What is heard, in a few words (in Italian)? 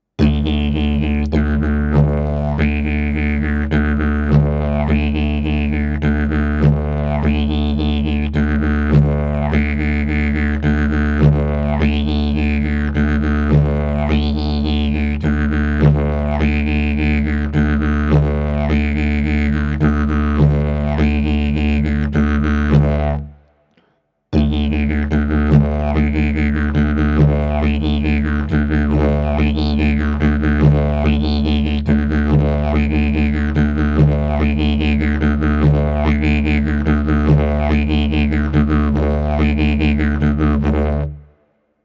ritmo livello principiante